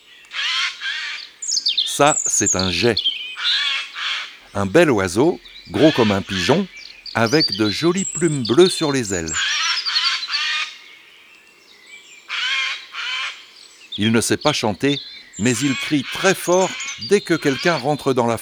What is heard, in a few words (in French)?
Imagier Geai des chenes